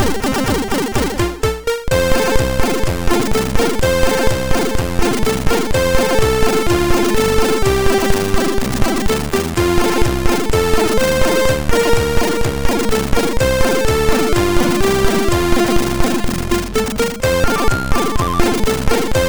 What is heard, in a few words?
Saints Go, sid MOS6581R3